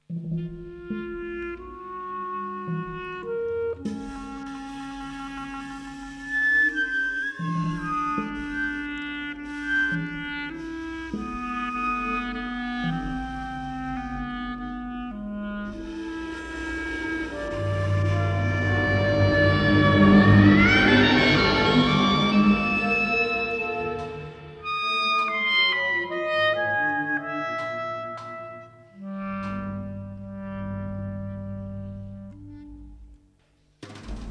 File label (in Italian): Sarabanda